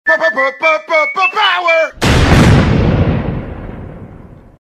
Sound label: power; svencoop; FZSaySound